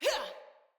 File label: Attack Female; Battle; Envoy